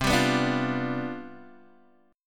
C Major 7th Suspended 2nd Suspended 4th C down Guitar DADGAD, chord